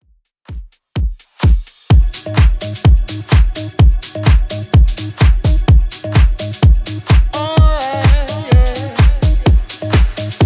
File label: House Redrum 127bpm